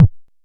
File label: analog quick, Kickbank